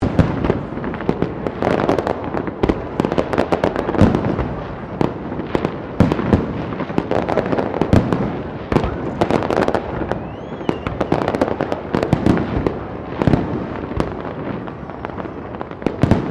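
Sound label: Ambience New Year's Eve Melbourne Crowd Fireworks; New Year's Eve 2008 Fireworks; Firework Sound Effects; Foundation1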